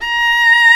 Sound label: Vla Bb4; Digital Sound Factory Virtuoso